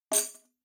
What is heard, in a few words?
Tambourine Rim